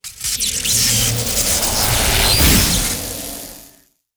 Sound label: near Win; netent